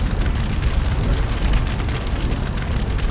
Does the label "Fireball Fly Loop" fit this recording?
Yes